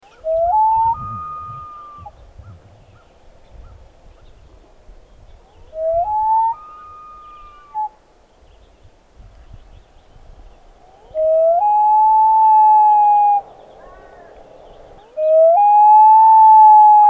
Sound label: loon bird call, kayaking; paul lake